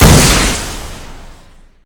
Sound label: bazooka; garrysmod